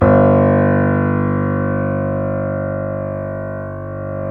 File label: C7; East West; The Ultimate Piano Collection